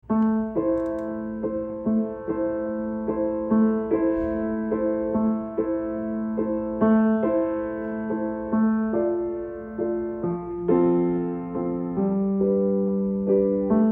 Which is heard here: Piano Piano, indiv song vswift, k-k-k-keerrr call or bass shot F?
Piano Piano